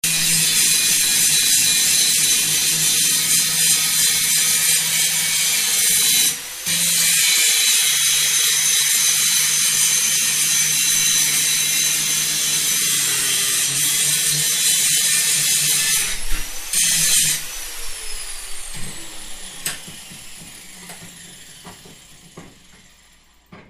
grinding steel in his workshop; sculptor guy thomas; stowford manor farm

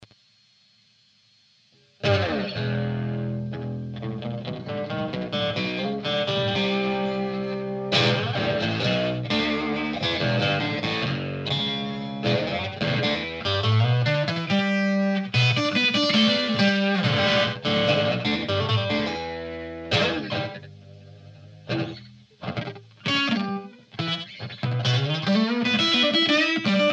noise octave rusty sproing

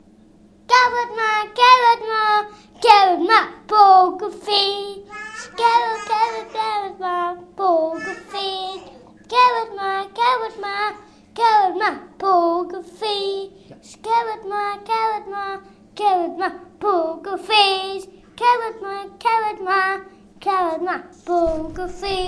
my son singing

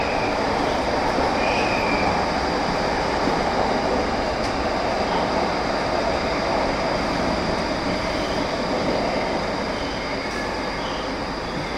leaving train at high st glasgow; uksm

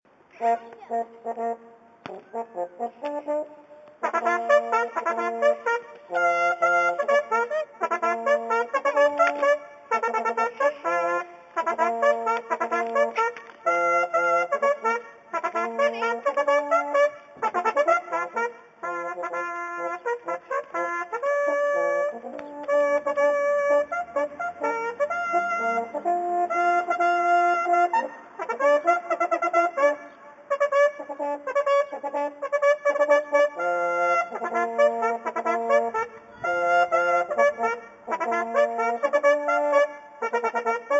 warming up my bugle

